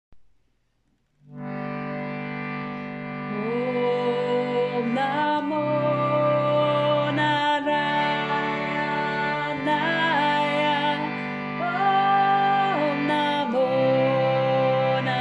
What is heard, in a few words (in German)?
om namo narayanaya 1. Stimme